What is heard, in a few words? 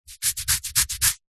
itch scratch skin hairy; human